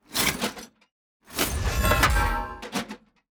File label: hammer of justice strike